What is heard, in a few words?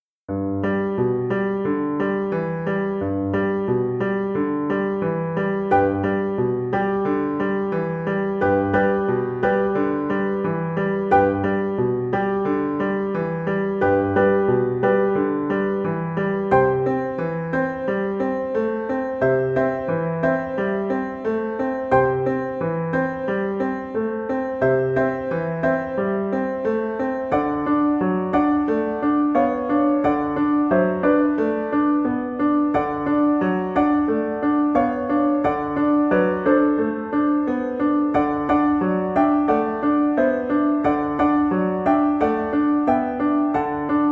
carouselle, Flute